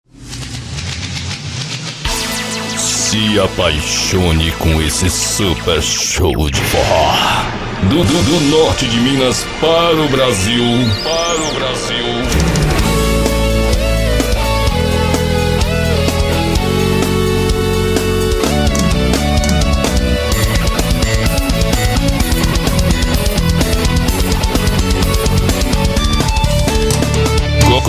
vinheta de abertura

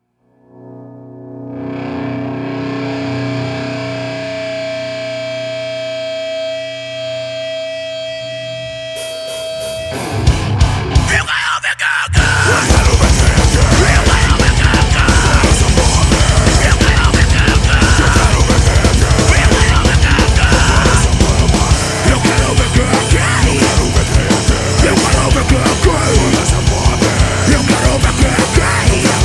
ao vivo no estudio

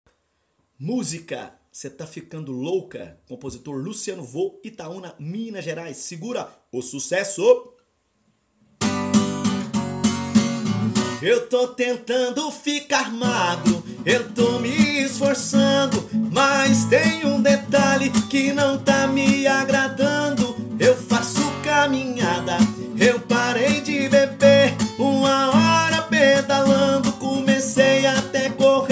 sertanejo

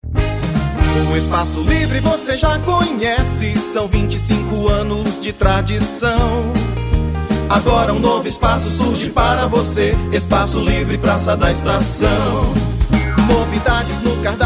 jingle; espaco livre curvelo mg

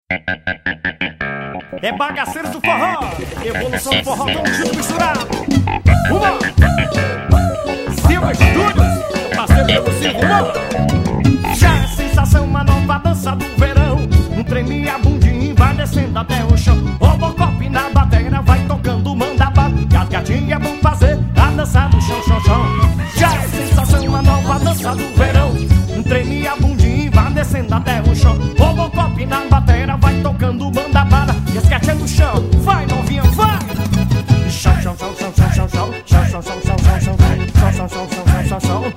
forro ao vivo

